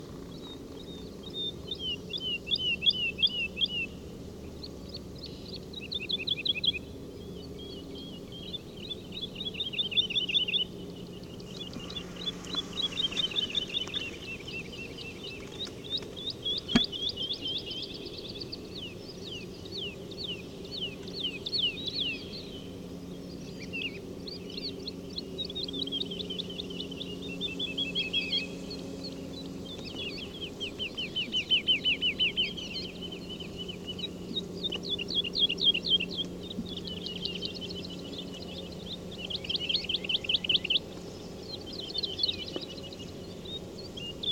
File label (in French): alouette lulu chant